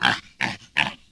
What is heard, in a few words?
Pig Grunt; Civ4 warlords; Resouces and Improvements